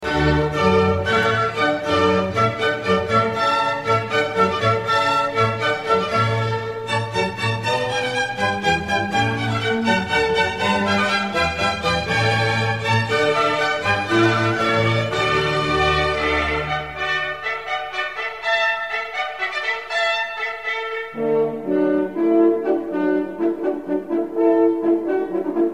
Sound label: hornpipe